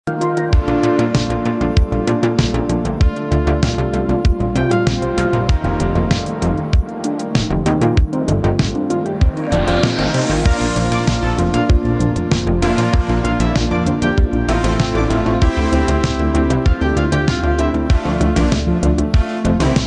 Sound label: an inspirational synth beat to align people